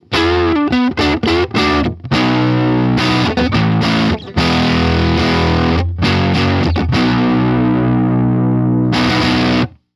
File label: Stratocaster Neck Dirty